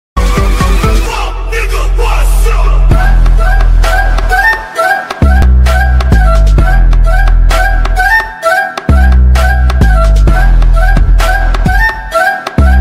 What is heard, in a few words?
english remix ringtone